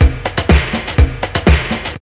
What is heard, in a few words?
house loop